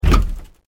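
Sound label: BUICK door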